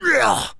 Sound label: Male Attack, Zombies; zps; Male1